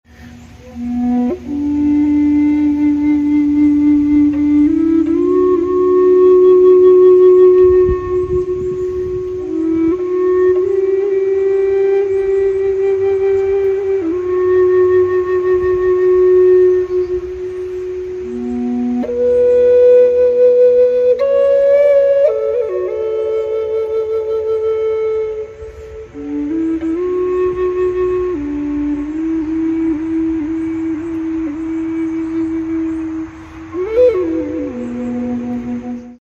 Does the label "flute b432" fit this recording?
Yes